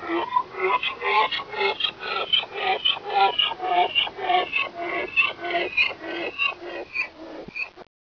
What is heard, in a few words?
alien cry; ambience